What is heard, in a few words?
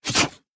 spitter; factorio; Factorio 0.14.21; creatures